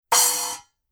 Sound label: 10 red splash choke, paiste; color sound 900